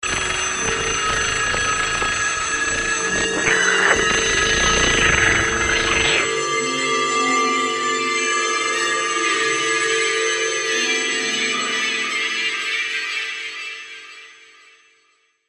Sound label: phone ringtone